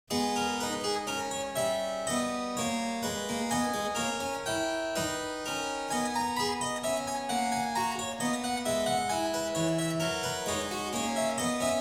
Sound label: Prelude in G-sharp minor, clavier; Prelude & Fugue No.18; BWV 863; BACH; WELL TEMPERED CLAVIER; BOOK I